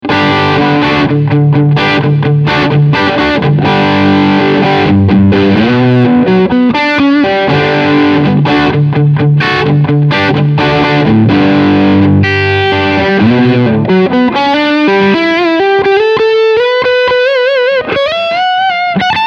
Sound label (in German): OD Riff Steg PU